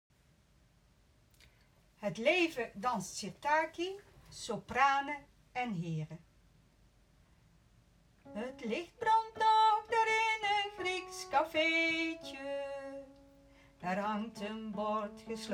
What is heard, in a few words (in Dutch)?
Het leven danst Sirtaki Sopranen heren